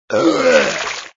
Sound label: reaction vomit; coffee shop